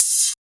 Dry Open High-Hat Sample D# Key, Royality free open hat sound tuned to the D# note